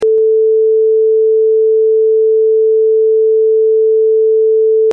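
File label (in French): 440 référence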